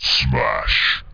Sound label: Gorilla; PC Gamer IT CD 41; machines demo